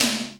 D3H-SNR; Zero G; Man Machine

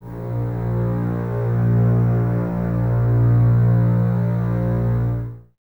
BSS A#1, String Ensembles, Basses, f Full; Miroslav Vitous; 90 s Sample CDs

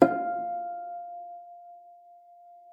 FLAG E5, harp; Xsample VOL-8; HARP+FX

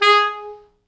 G#3, Pop Brass; Best Service ProSamples vol.19; AKAI

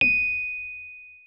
Rhodes MK1 D#7; Xsample VOL-1

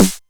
909 Snare; Drums; Novation Drumstation

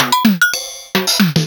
Casio HT-700, Samples Sliced

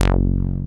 BASEMENT BASS; Masterbits; Dance Trax

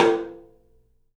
open tom; 3D Sound; 3D Drums

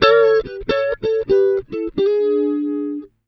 92 BLUES, Acoustic Guitar Loops; Electric & Acoustic Guitar Loops; USB Soundscan vol.04; AKAI

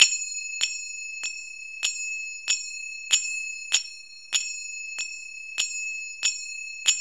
FING CYM BEAT, ETHNIC CYMBALS; Zero-G Ambient 1

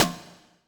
Snares, Elektron MachineDrum SPS1 MKII

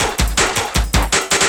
Drum & Bass, 160 BPM

